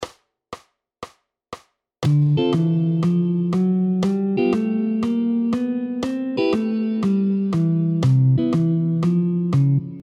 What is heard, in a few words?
Chords & Bass Combined